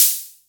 Crispy Open Hat Sample F Key, Royality free open hat sample tuned to the F note